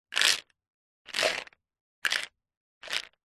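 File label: vitamin tablets in plastic pot lid on shake; household; zapsplat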